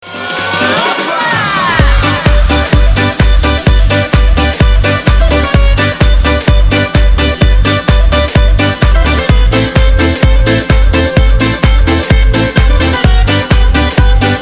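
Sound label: jingle; Otbivka 2014; отбивки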